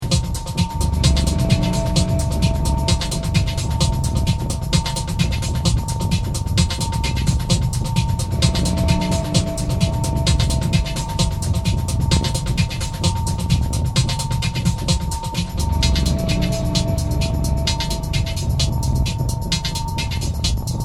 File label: Purring Machines